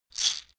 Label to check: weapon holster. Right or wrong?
Wrong